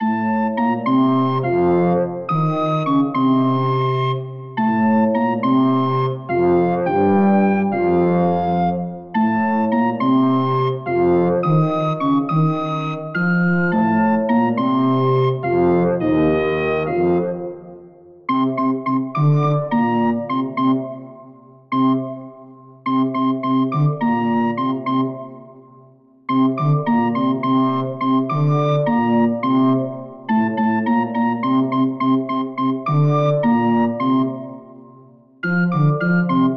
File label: Test Recorder Big Loop